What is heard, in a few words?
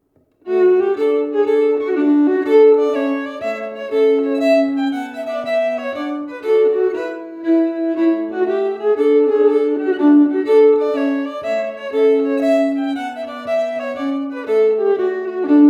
Jennings Champion Clog melody focused